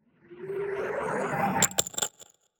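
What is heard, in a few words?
magic soul trap capture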